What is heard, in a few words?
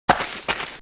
Dwarf Move Sound